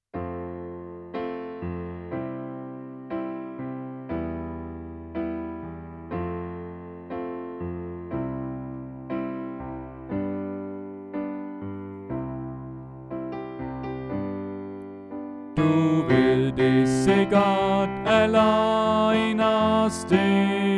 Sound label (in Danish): Bas; oevefiler